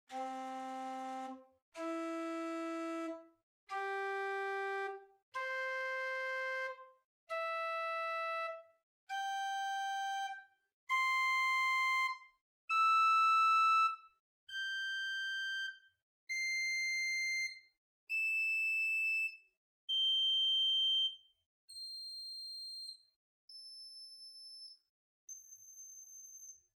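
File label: Prinzipal 2 arpeggio, Chamber Organ; Ott, 2003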